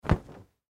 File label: Shirt Shake Out, Shirt Shake Out Flap Clothes; Household; Shaking; Blastwave